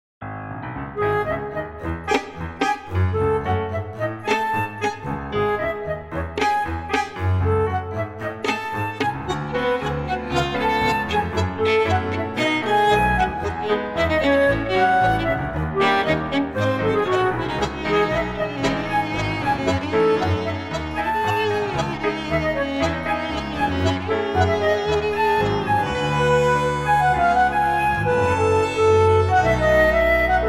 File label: play flute reference, Cm